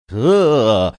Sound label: making funny sound